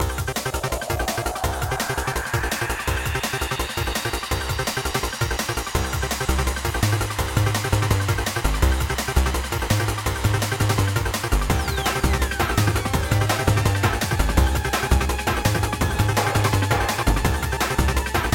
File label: sweepy